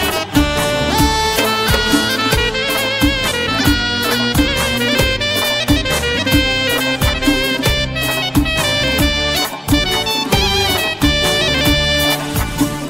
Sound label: Accordion